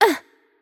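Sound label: Hurt Light, German; bloom minigame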